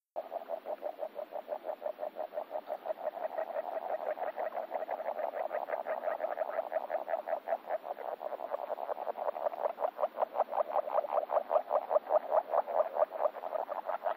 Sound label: Agile frog; amphibians in france